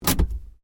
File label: Handbrake On, car; Hyundai Accent 2008; Hyundai hire car; Vehicles